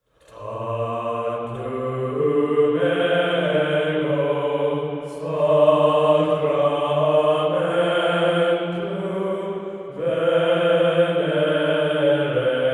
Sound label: bass rvb lacking INSTRU